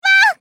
dying; Lulu

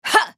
effort; Fiora

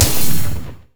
frostbite; LoL; anivia

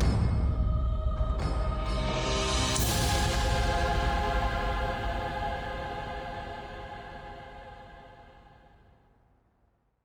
drum trans; LoL; Game Music Events; crytal scar phase 1-2

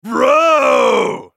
laugh; Brolaf; Character Voices - English

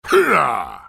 effort; Darius; Character Voices - English

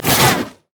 swipe; nidalee

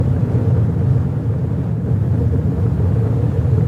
summonerspell base ignite; LoL

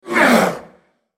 spell cast effort; Thresh; en US

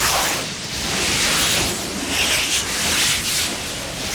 hypercharge loop; LoL; jayce

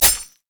crescent slash; LoL; akali

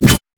crit attack; LoL; sion

